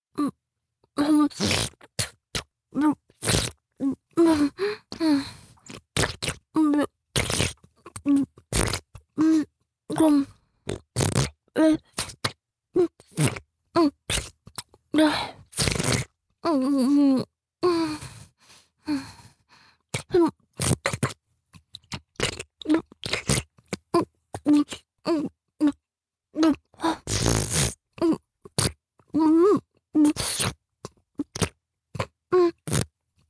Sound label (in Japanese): お掃除フェラ(中):亀頭を咥えて中に残っている精液を吸い出す, ASMR; 実用ボイス抜粋集